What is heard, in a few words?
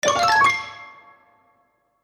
Cartoon, transition